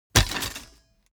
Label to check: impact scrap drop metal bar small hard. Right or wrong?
Right